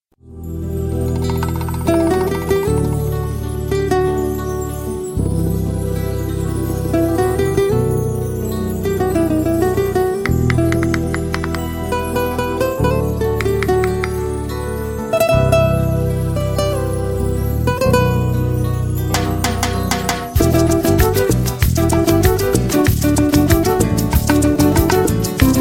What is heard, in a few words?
Spanish Guitar